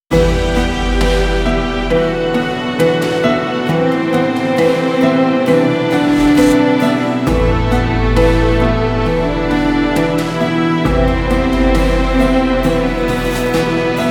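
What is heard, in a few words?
dramatic piano; by burak sound bank; dramatic dram vol 2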